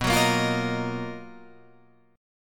B Major Sharp 11th

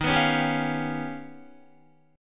Eb Augmented 9th Eb down Guitar

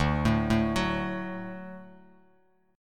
D Major D down Bass Standard; D arpeggiated; JGuitar

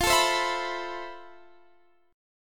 F Major Sharp 11th, Guitar, Standard; strummed; capo 9

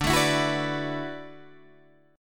D 6th Add 9th D, Guitar, Open D

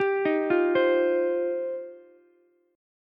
G 7th Suspended 4th Sharp 5th, Ukulele; G7sus4#5 arpeggiated

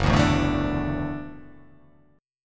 Gb Minor 7th Sharp 5th; strummed; JGuitar

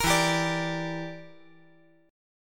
E Minor 7th Flat 5th E

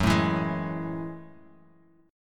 Gb Minor 9th, Bass Standard; strummed; JGuitar

